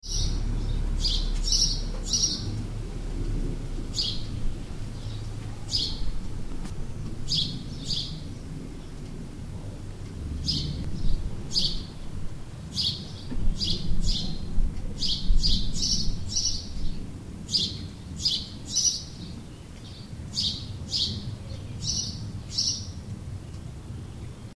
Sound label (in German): Haussperling